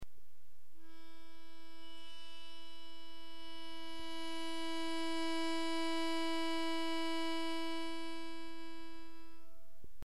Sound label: E Natural Low